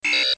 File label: beep; Gundam